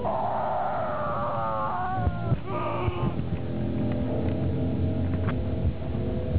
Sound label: screams